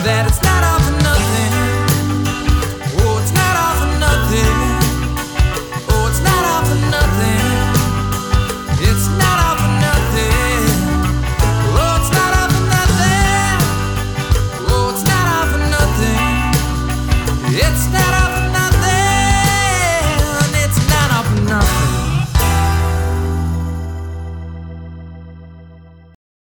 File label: clavinet